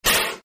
Air Mortar Blast From Close Point of View, At 75 Pounds Of Pressure, X3